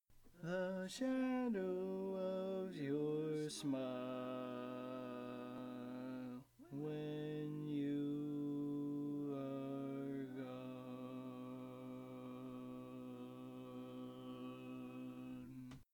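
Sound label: Baritone, barbershop tags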